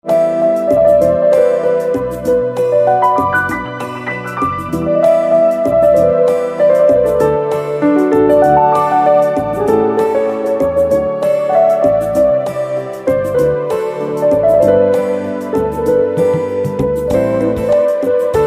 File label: Piano